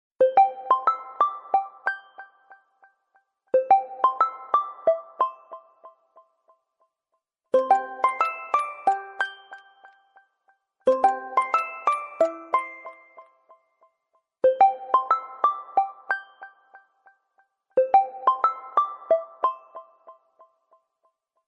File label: Oneplus Background Music Ringtone; Oneplus Nord Ce 5G